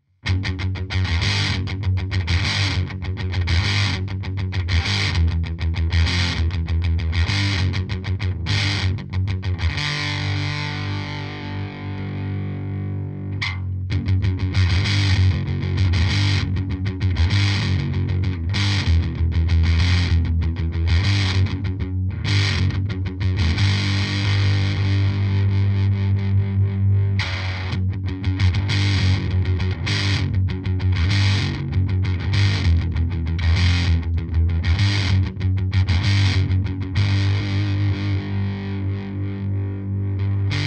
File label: fuzz